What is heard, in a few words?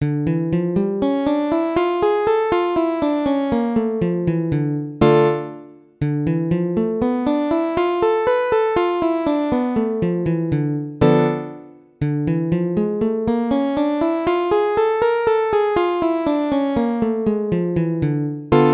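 D minor gypsy scale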